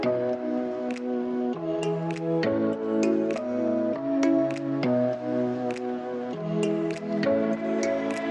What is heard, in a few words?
Huawei Message Ringtone